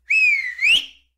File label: whistles